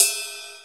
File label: Ride